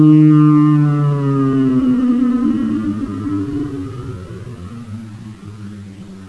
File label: SHIP FLY